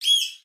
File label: bird